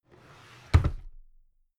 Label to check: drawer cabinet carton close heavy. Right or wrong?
Right